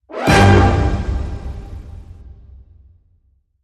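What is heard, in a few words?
Hit Orchestral Glam G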